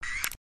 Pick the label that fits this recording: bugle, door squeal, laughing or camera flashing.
camera flashing